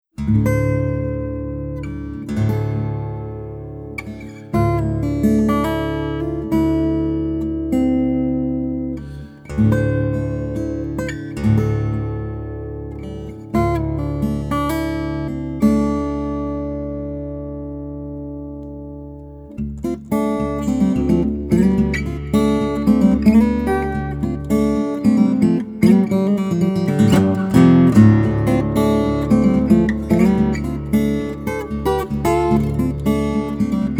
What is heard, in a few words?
Train Station Blues, solo guitar